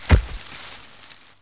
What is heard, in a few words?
Med step, Dino